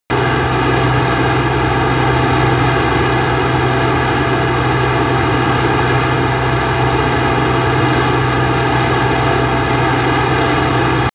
military jet fighter steady noise

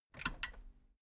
metal button radio push power off

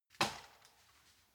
wood limb crack big

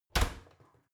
oven close

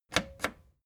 record player play click slow

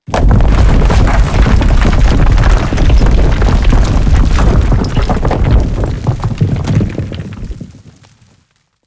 large roll drop

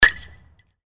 bat swing

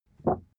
cardboard wobble short medium

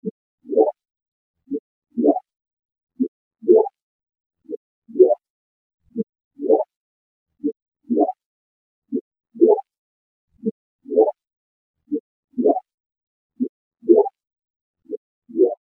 bubbly surface noise

